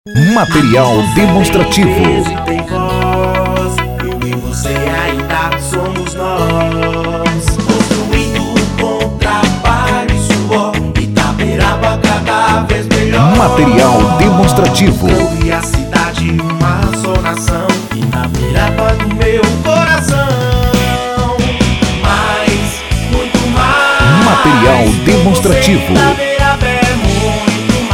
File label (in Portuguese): JINGLE; ITABERABA